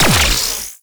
Trip Laser Activate; Trapper

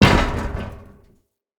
Ruins Machine Surface Impact; Props

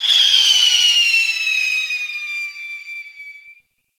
Spam Projectile Whizz

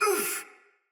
Pain Soldier Mace Agent Female; Battle; Sinecure Melee

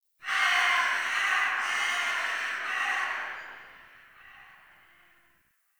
Tree Distant Critters Scared; Warframe; Duviri; Ambience

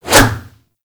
Staff Swing; Warframe

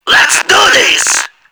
Tentacle Swing; Enemies; Abilities; Warframe; Proto Infested Ancient

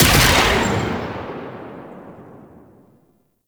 Arch Railgun Charged 3D Fire; Warframe; Archwing

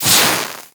Axe Projectile Impact; Warframe; Weapons; Tenno; Sundial

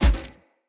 Foley Armour Step; Characters; Enemy; Mockeries; SubBoss; Mockery

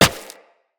Operator Run Rock, Footsteps; Characters

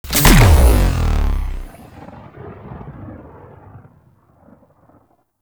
Rail Jack Ship Power Tether Fire; Warframe; Vehicles; RailJack Powers

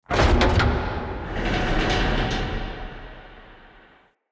Wall Gate Start A; Movers

